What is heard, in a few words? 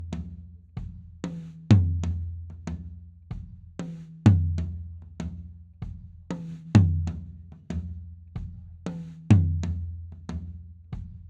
tom II live; md 421 kompakt; mikrofonu test